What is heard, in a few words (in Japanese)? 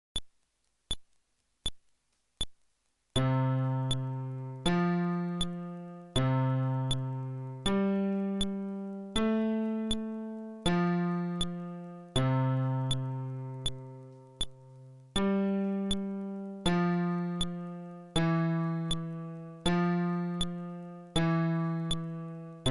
フレットレス (テンポ80)